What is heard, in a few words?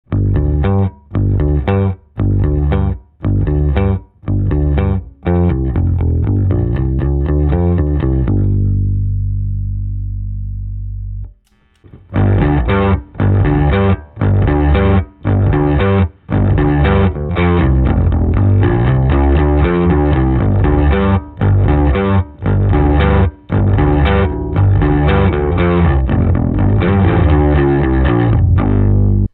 VT pedal, fuzz; overdrive fuzz